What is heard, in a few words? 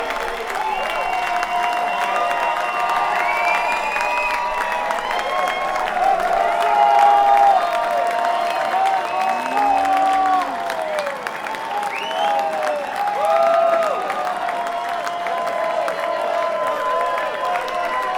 Crowd; Bluegrass; mk41